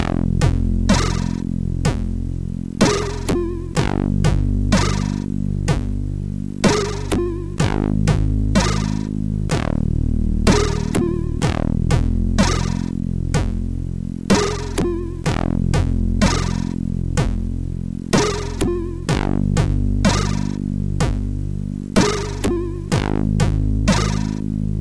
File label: Little Game Tune, MOS6581R4; hvsc